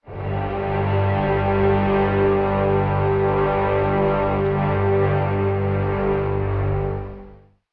sustainment, String

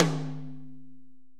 Toms

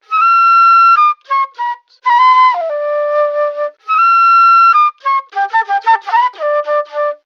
flute wrens, Loops, Jazzmatazz

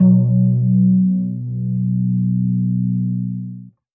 fling, Bass; Notes

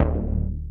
swirl; Notes

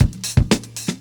Drum loops Boombap

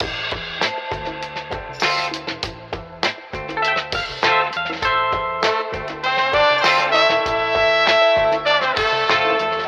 full, Loops Phonky

